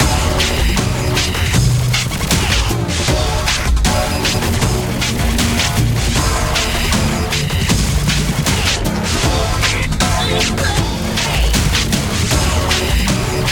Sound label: battle; Let It Die